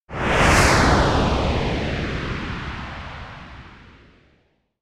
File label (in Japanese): 風の魔法; くらげ工匠